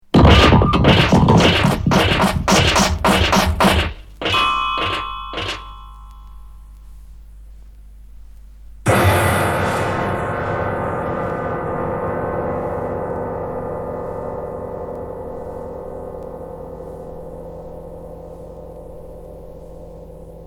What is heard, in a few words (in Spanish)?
musica electroacustica espanola